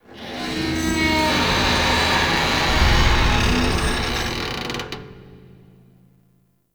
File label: Metal Scrapes And Hits, Asteroid; Warframe; Grineer